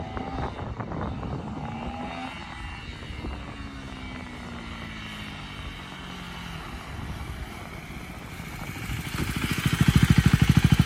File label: KTM, اگزوز